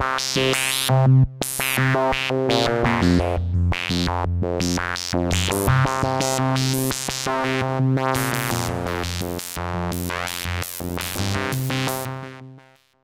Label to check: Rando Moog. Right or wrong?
Right